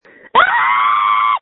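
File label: Scream, phone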